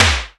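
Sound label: SP-12, drum machine; Best Service Gigapack 2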